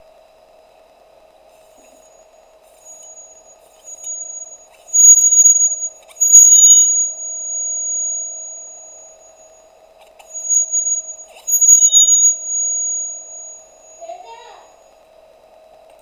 74.5 cm (Holding 0.25L) Longitudinal, Shrieking rod, Aluminium Rod; 75 cm; Kanifoli; georgia 2010